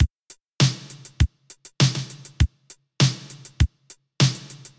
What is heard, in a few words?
DRUMS 100BPM; Vostok Inc.